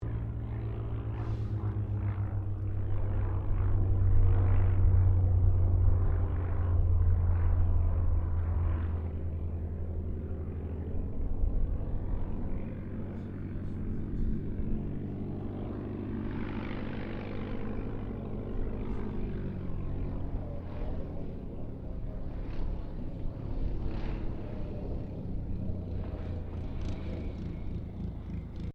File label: Aircraft Dehavilland Tigermoth Landing Approach, RAAF Tigermoth biplane training aircraft